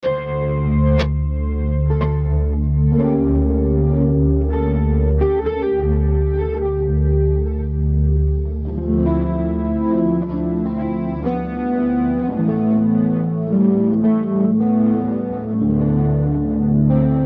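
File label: Musical Element, Drum Snare March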